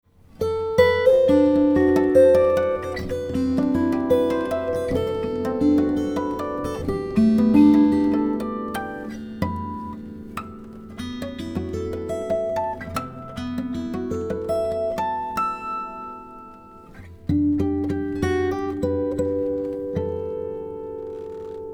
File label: guitar